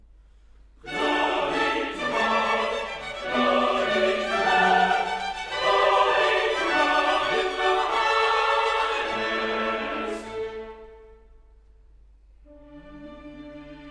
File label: glory to god, chorus